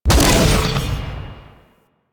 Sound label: Gun Blade Shot B; Crp Gun Blade